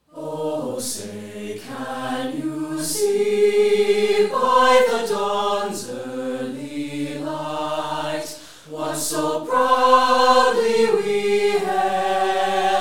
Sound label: Concert Choir; Handel